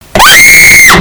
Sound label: screammm; peko; vtuber